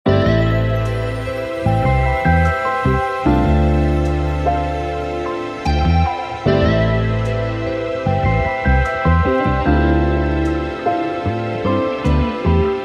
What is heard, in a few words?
75 BPM A Min; Cymatics; Close Up; Havoc Collection